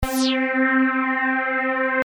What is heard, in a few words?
chorus